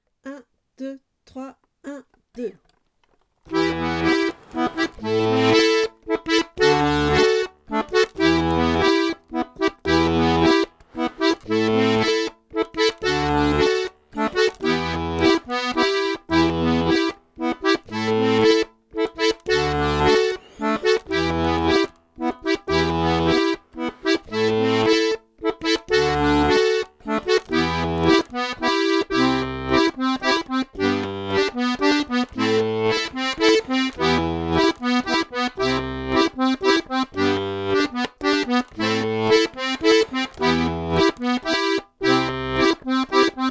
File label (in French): la tete ailleurs rythmique normal